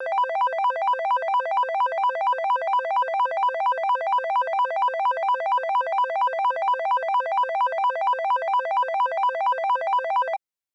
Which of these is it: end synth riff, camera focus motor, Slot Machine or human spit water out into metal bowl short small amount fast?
Slot Machine